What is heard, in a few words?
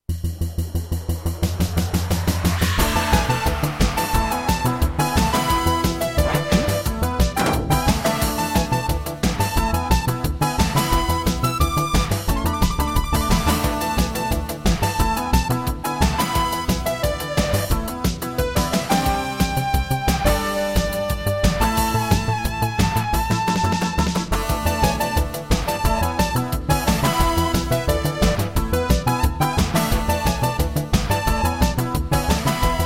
Roland CM-32L; Willy Beamish